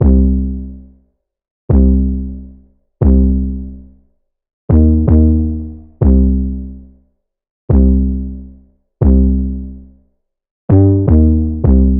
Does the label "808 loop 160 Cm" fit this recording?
Yes